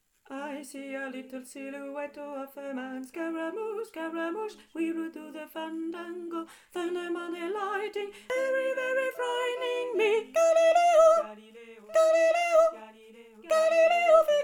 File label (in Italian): soprano 2